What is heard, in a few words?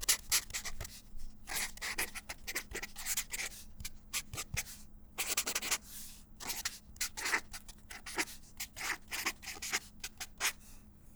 Thicc Tip Writing, Books, Paper, Writing; bb - Books, Paper, Writing